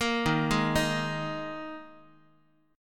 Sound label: Dsharp Suspended 4th Guitar; arpeggiated; chord; x,x,1,1,0,2; JGuitar